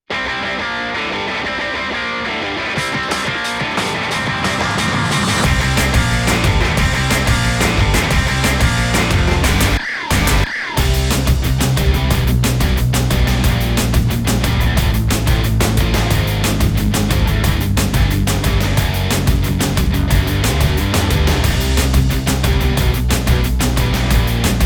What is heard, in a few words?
Instrumental TV Size; Yorinuki Gintama-san